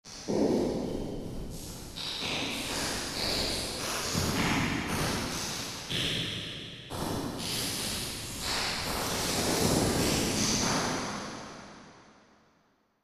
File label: Large Hall; lexiverb